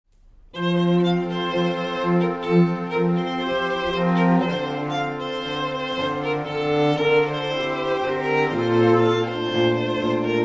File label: strings